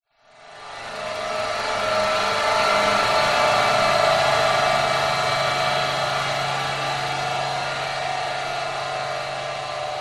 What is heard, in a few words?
horror accent x2 long evil metallic swells